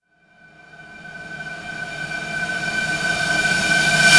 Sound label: Sweeps Medium